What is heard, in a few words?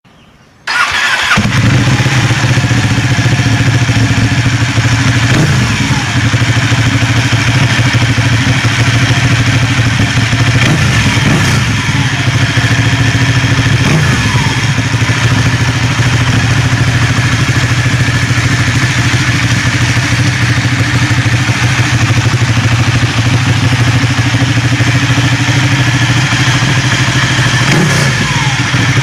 Ducati Panigale S